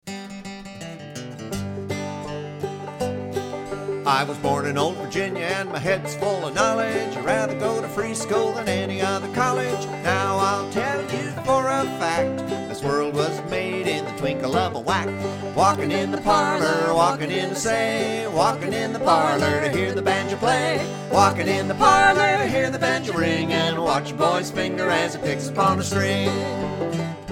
guitar/lead vocal; 2-finger "seconding" style banjo; Walkin' in the Parlor